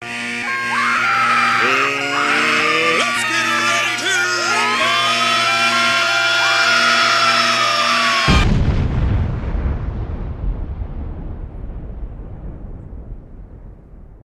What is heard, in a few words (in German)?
wecker abartig; unserding